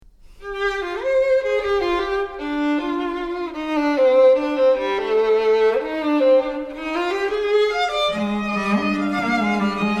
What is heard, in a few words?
Strings